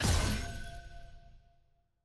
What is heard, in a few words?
ui purchase button click